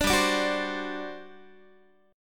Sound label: G Major 7th Suspended 4th, G, down, Guitar; GM7sus4; strummed; 17,15,17,16,16,x